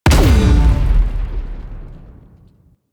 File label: Stomp Impact A; Warframe; Corpus; Jackal Redux; Abilities